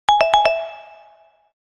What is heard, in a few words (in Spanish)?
campana metalica; whatsapp